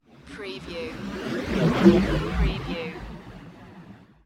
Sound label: Scifi Production Element - Zoom By